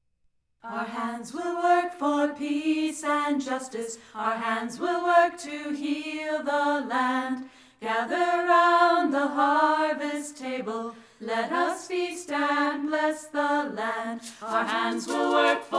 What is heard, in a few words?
Harvest Chant; elements; chants